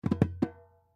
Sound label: Goombay Drum Roll, Goombay Drum Roll Hit; Musical; Drums; Blastwave